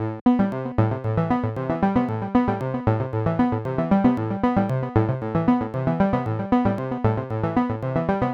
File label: nu disco synth arp 115 bpm